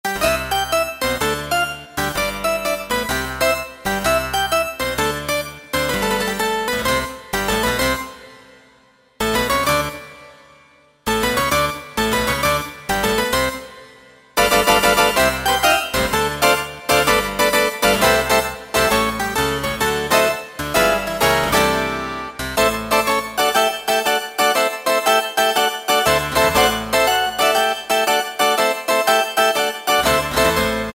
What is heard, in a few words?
Quillsichord, Kurzweil K2000; Kurzweil K2000 V3